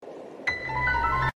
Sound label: piano; spoompkee